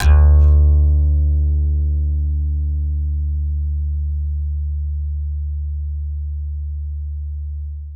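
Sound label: acoustic bass slap D2, left; Masterbits CLIMAX 7 - Bass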